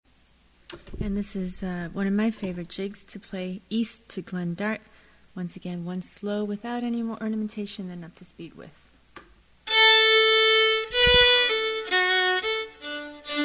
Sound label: Jig, fiddle; East to Glendart